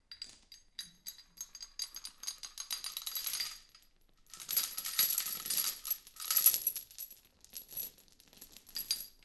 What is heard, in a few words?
chain movement; DeadFright